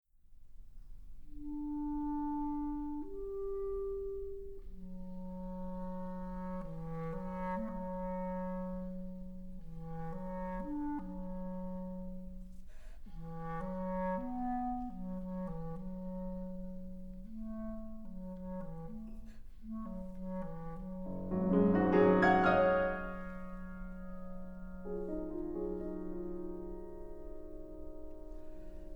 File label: Clarinet Sonata Excerpt